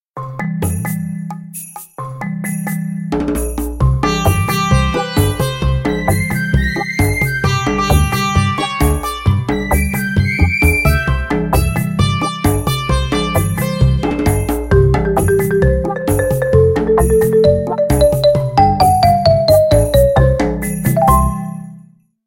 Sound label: Jungle; Hisense U961